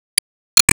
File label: Sys Emotion Think